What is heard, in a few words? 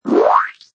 Power, Sci-fi swipe; Sound Design